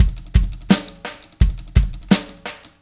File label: Static Beat; Sonic Foundry (Sony Creative Software); Indie Rock Anthems; Session 13 - Static